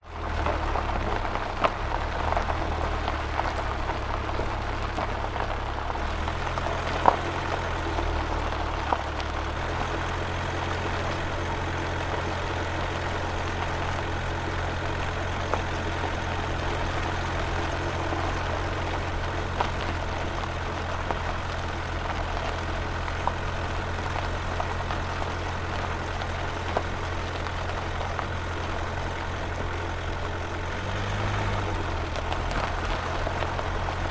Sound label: vehicle car suv drive slow gravel road track close to tyres